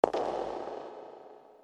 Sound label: step